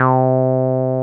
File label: 303 OW C4F, 303 OW BS; Drums In Your FACE!